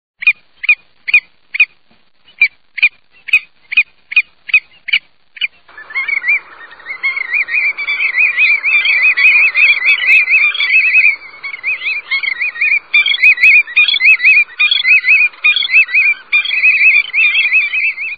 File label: Willet; Birds